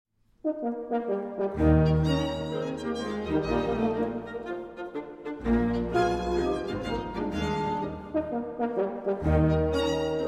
Septet E-Flat Major, Scherzo- Allegro Molto e Vivace